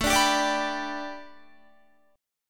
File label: A 7th Suspended 2nd Suspended 4th Guitar; A7sus2sus4 strummed